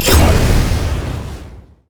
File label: flamethrower; rumble skin03